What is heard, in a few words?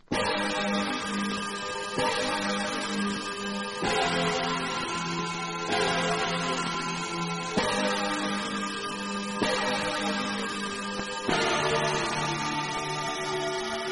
synclavier